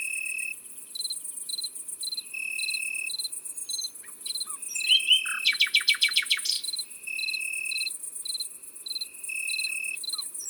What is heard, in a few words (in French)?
Grillon champetre; Drome; Concerts Naturels de la Drome; Studio les Trois Becs